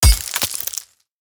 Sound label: axe mining ore; Factorio